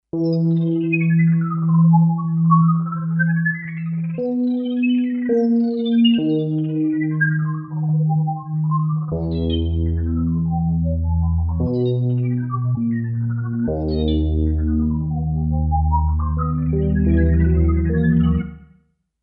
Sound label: Kawai K5000S; Trolddom